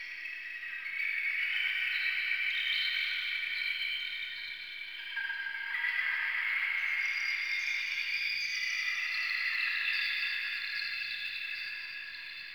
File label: Infested Eris Interior Caves Bog Loop